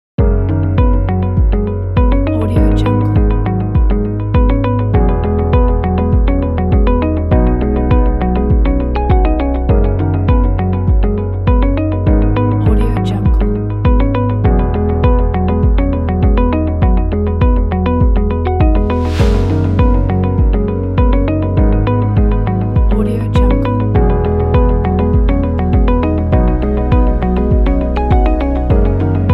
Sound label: inspiring background music